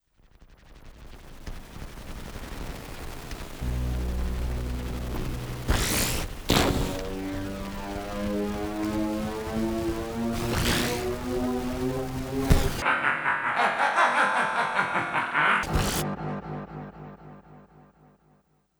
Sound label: Boss Laugh low synth; macos ps4 switch windows xbox one gamerip 2021; Space Otter Charlie